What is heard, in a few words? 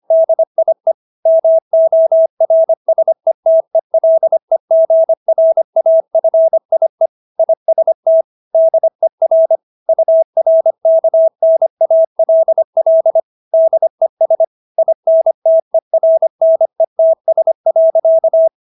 Motto 125BpM